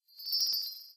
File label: star fly; beauty